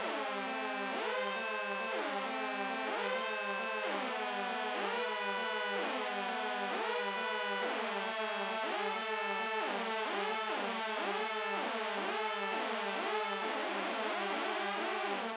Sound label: alarm; nord